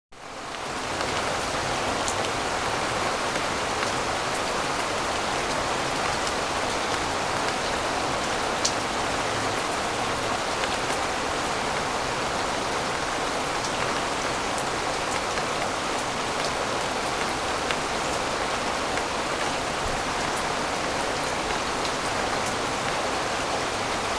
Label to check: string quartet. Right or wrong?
Wrong